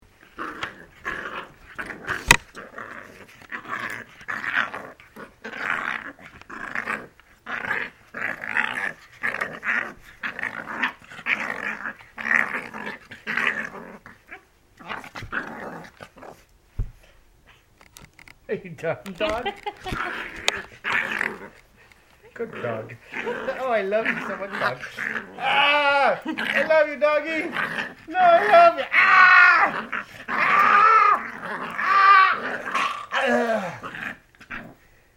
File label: grrrr